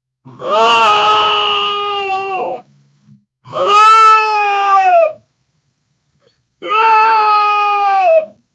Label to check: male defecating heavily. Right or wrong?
Right